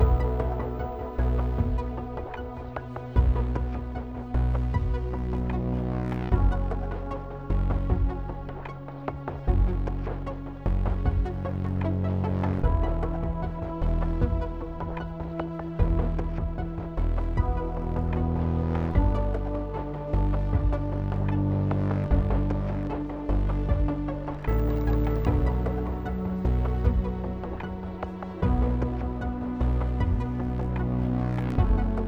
Exploration Night 8CH 76BPM

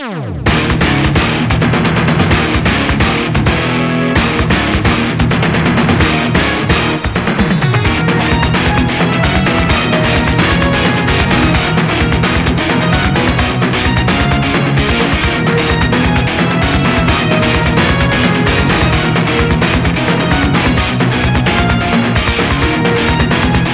Thunder; blazing chrome

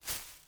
foliage jump

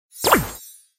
vault upgrade type

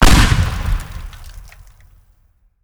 explosion

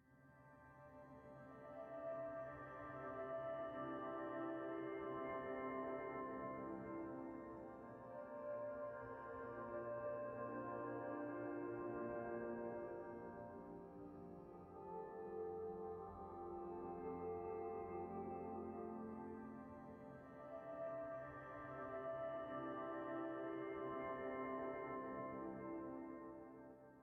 cutscene music

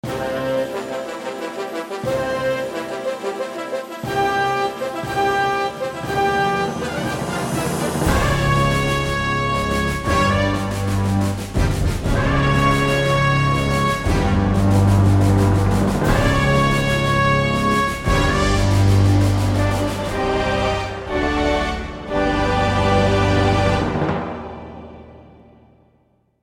German Victory; blitzkrieg 2

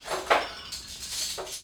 Ambient Kitchen; Levels Hub Restaurant 03 Act Kitchen; Ambient Kitchen Oneshot

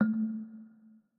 Move A 3 Mf; Mixolumia

